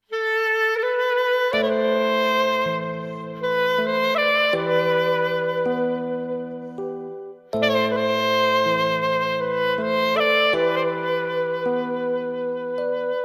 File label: Clarinet